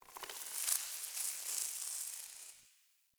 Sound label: Foliage Growth H; Powers; Fey Eldveil; Eldveil Stillness